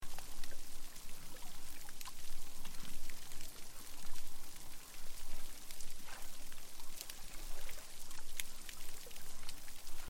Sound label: ambiant noise